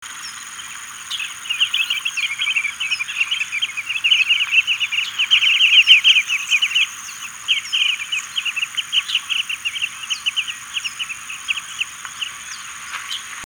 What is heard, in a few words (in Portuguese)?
canto do macarico de perna amarela